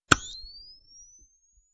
flash; old camera bulb flash

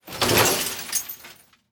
glass debris

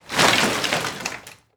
wood debris drop

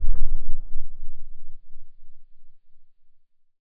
distant explosion; torpedo

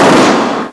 SMASHING, heavy object crash

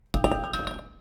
metal tool drop

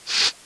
sniff; ogre

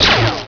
LASER, retro

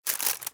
paper bag open

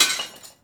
glass break

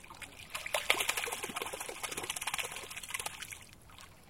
hand water splash

